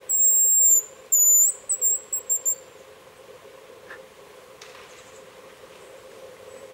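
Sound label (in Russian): песня рябчика на рассвете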